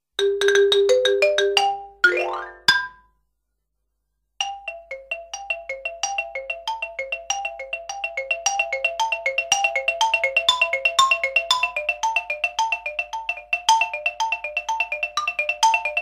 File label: Xylophone Percussions